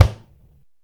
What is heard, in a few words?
drum